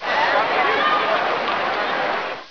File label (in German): Markt; PC Gamer IT CD 48; 1602 AD; 1602ad demo